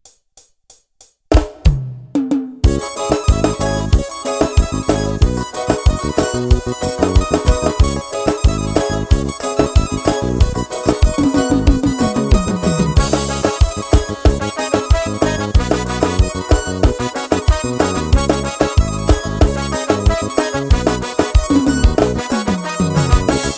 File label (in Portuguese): forro estourado